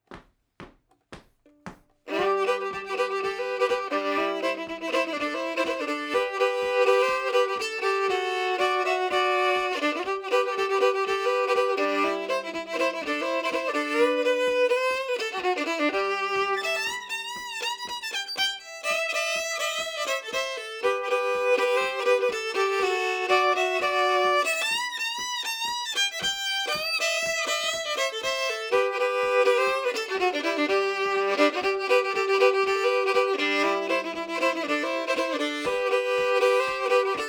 Shuffle, fiddle; Mississippi

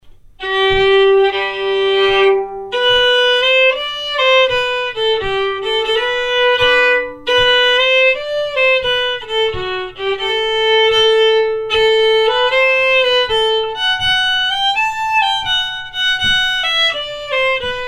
Hambo slow; Hambo efter Lasse i Svarven; fiddle

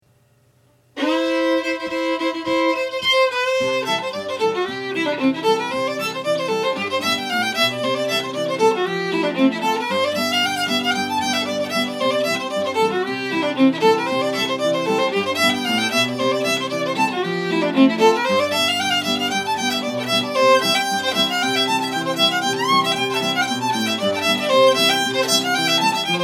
in C, fiddle; Grey Eagle

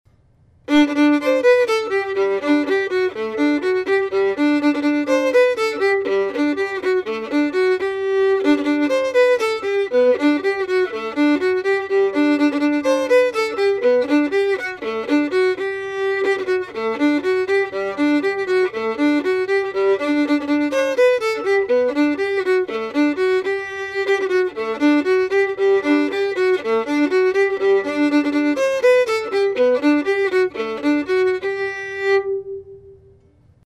Galopade; Spanish; fiddle

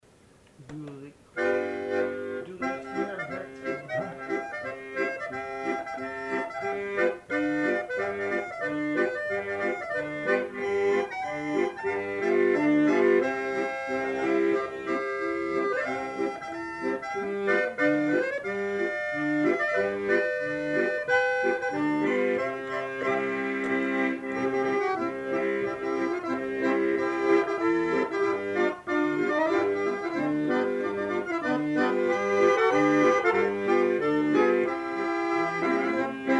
Oh Susanna Schottische; fieldwork

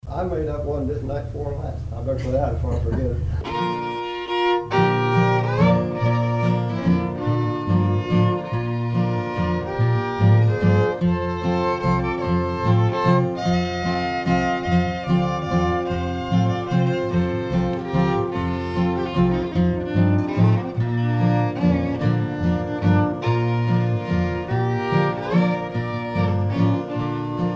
original waltz

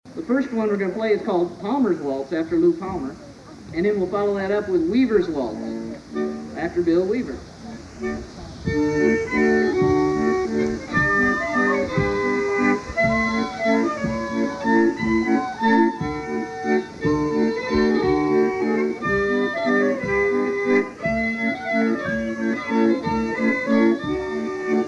Waltz; Palmers Waltz; greatlakes; battleground

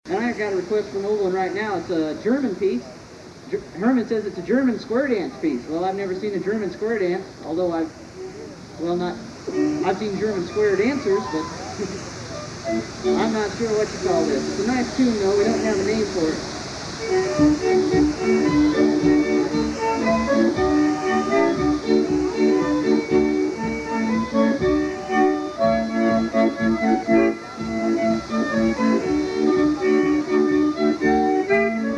German quadrille tune; greatlakes; battleground

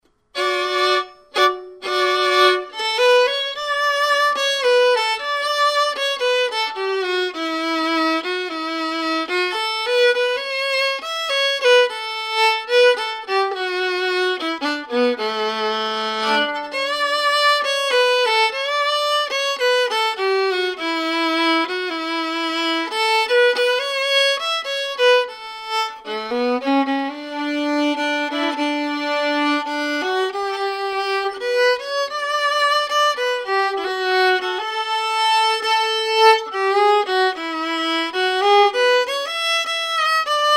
Waltz, fiddle; Shenandoah Valley

